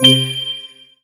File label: click; theodyssey; game